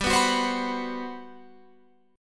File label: G Major 11th, Guitar Open F; strummed; JGuitar